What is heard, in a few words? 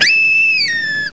WOLF; ANIMALS; CMI Sound Library Rev 1.3